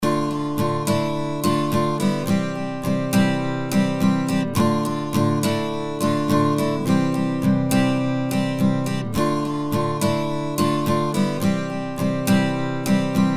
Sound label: Strummed Acoustic; Apple GarageBand 2; Apple Loops